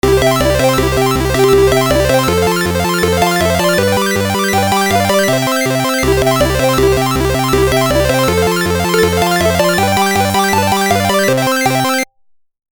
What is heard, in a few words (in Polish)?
jingle nowe